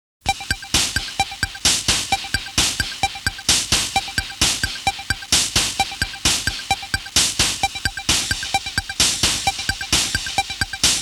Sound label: noise, chip tunes; Irdial